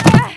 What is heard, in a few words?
fall; sydney